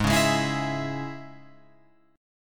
G sharp Suspended 4th Sharp 5th G sharp, Guitar Open F; G#sus4#5; strummed; JGuitar